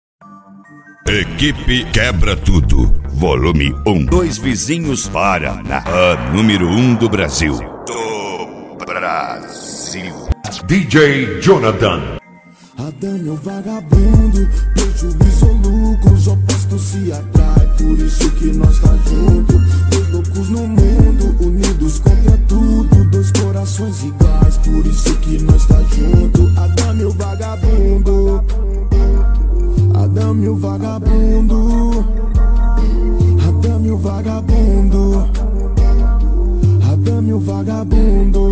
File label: rap bass